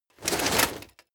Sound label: plate armor movement rustle